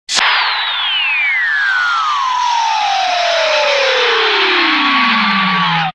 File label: fall cartoon long fall; h-b; cartoon hoyts